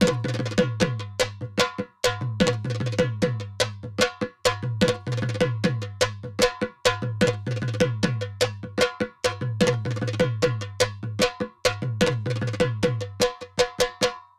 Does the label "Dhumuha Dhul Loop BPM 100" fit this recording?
Yes